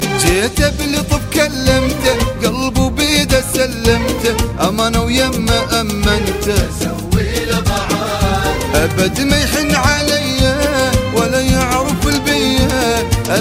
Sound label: Iraqi Song